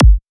plump kick

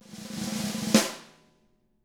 SNARE ROLL; ILIO; Double Platinum Drums 1; SNARE ROLLS

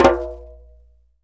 DJEMBE FLAM

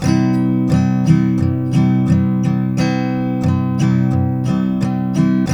TK MJ C#3, Guitars; Spectrasonics; Hans Zimmer Guitars VOL-1

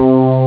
deep string; ST-XX Amiga Soundtracker Sample Packs

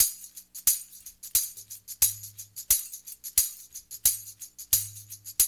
TAMB; East West

